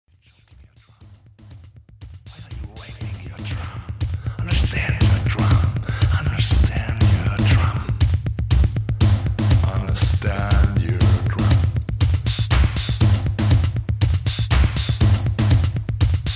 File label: drum abuse